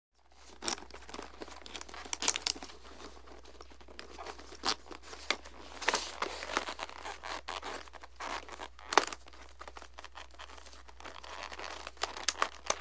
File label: Roller Blade Pull, roller blading